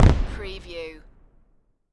Firework Boom Single

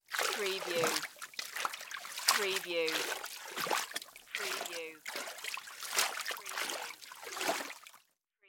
Splash Movement

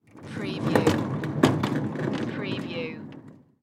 AV Cart Roll Short; sound effects bible

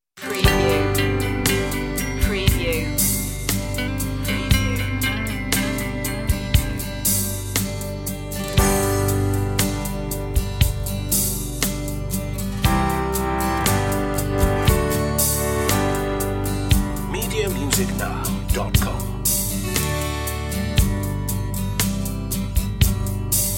underscore

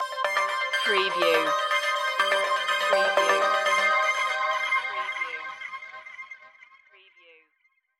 streamliner stinger

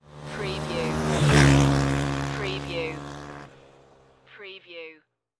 Motorcycle Dirt Bike Drive By Right To Left

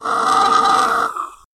Alien Gasp Breath; sound effects bible

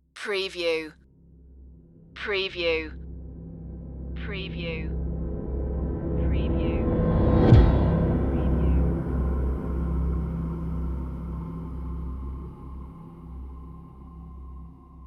tick tock tension stinger

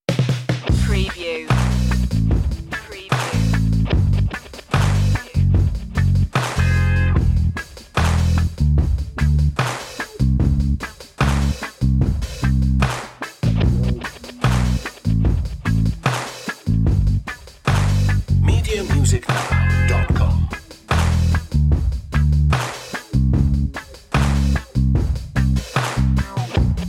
give a little underscore no keyboards

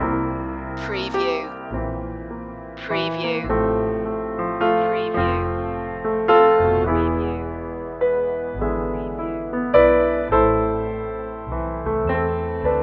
gospel piano loop

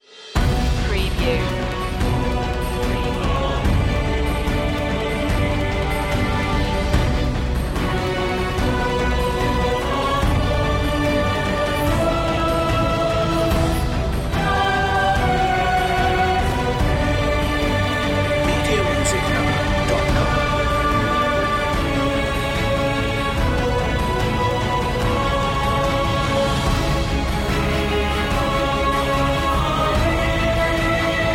with choir

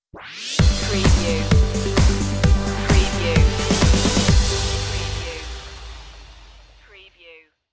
positive energy stinger

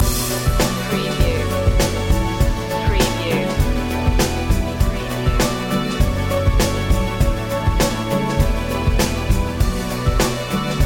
electron loop

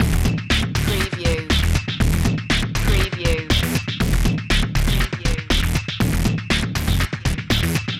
remix underscore loop

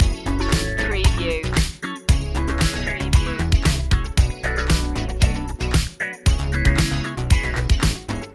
showtime loop

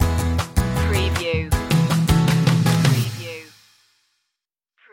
stinger

